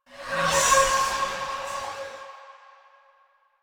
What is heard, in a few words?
Generic Foley; Warframe; Choir